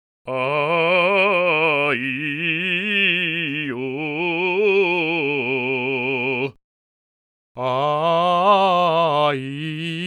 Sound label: Singer's Formant